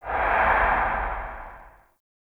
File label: Low Mech B, Ambience; Warframe; Nokko Colony